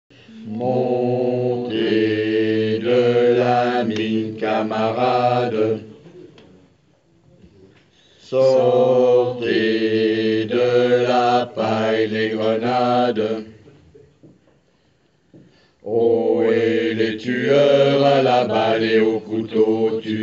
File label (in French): basses; Partisans